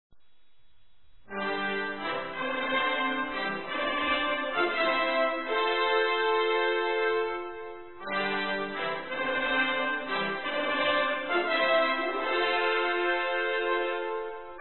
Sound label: olympics basic theme and fanfare short; christmas 2022 advent trumpets